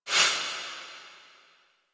Shawzin Score Window Open; Warframe

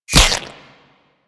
Ghost Expel Spirit Impact; Warframe; Priest Quest; Rell Ghost

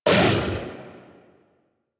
Armored Vocal Attack Short; Enemies; Kubrow; Warframe

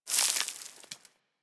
Settlement Sand Fine Debris; Warframe; Grineer Settlement

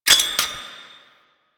Grenade Pickup, UI; Kahl; Warframe; Veilbreaker

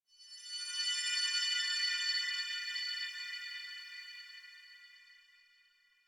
High Baoding; Warframe; Ambience; Clan Dojo; Dojo Randoms

